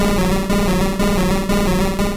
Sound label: Meditate